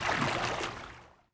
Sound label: water wade